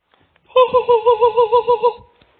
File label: hoho